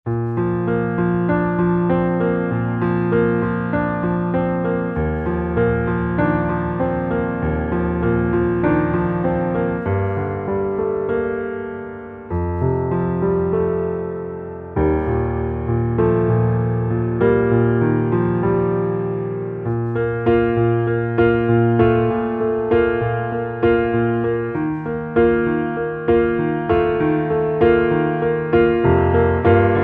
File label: Underscore PIANO; Worship Flow